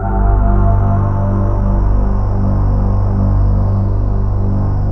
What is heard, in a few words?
T-3; Rubber Chicken Software Sample Bank; KORG